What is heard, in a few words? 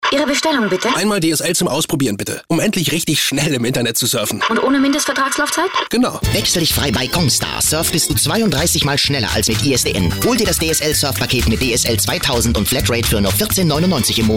funk; congstar; DSL